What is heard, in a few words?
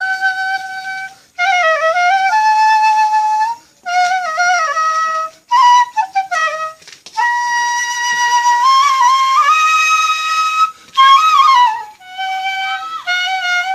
flute music